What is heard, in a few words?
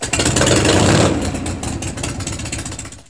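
motorcycle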